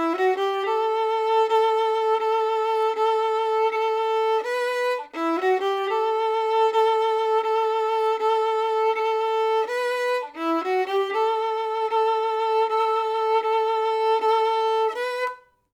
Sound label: violin